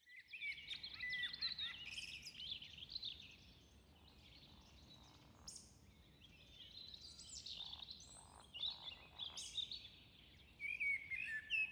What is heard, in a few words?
blackbird